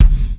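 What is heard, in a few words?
gated bass drum, Drums Acoustic; USB Soundscan vol.10; AKAI; 90s sample CD; GATEKIT 2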